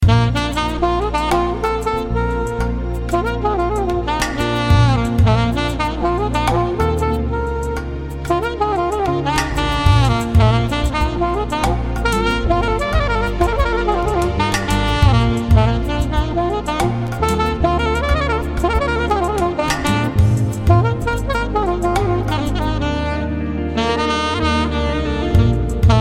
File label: SAXOTONE